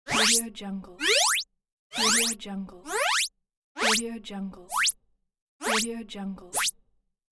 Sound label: cartoon slide sounds